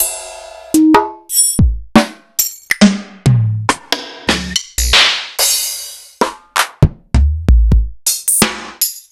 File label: EMU Proteus-2000; m8